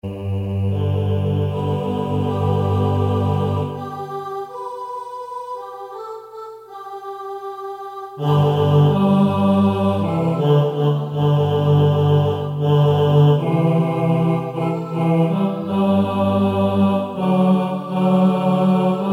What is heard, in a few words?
TENOR